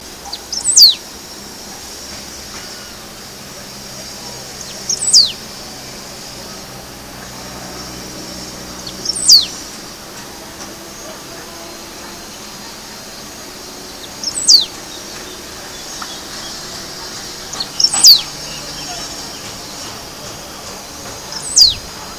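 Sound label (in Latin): Embernagra platensis, Uruguay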